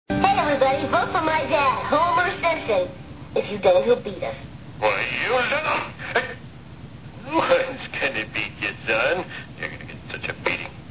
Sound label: beat; bart